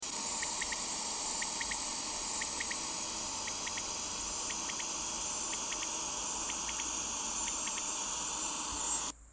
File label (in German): wachtel